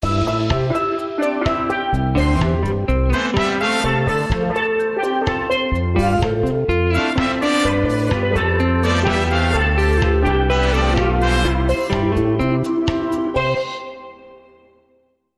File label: Calypso